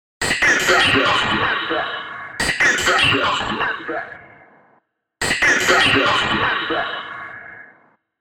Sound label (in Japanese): ディレイ